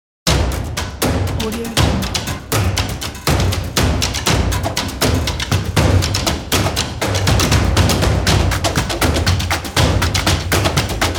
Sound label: percussion play sport